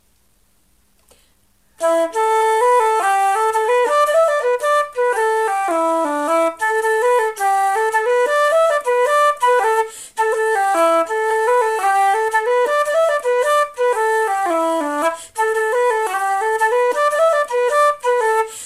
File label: slide, slow; Oakum